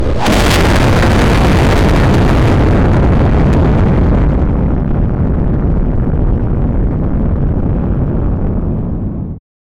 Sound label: loud sudden volcano eruption